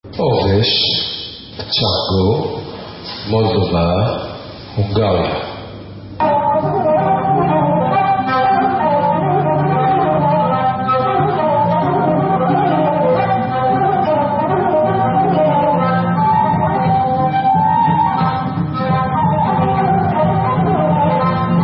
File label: Hungarian Instrumental; Oves